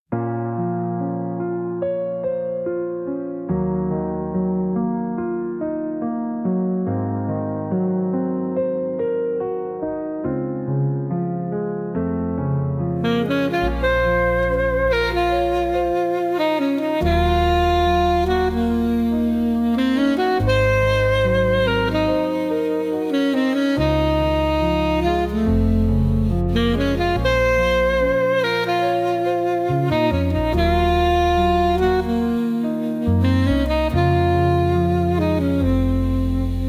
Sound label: sax and soul